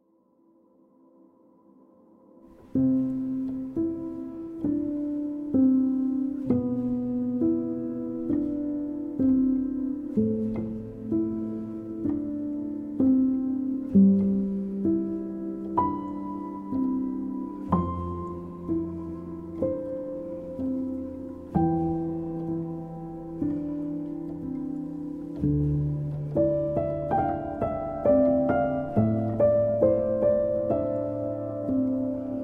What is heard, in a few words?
Bells With Voices